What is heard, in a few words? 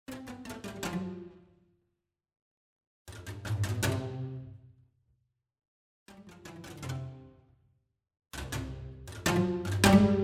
String Ensemble